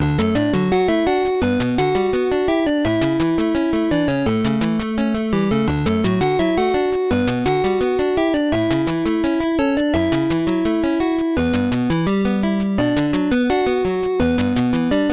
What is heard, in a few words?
solar pulsewave